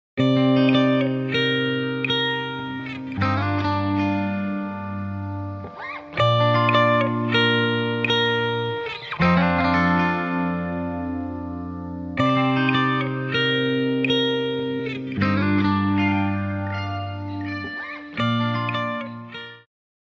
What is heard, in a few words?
Phaser Lightweight Stage Guitar Wet; Chromacycle; Syntheway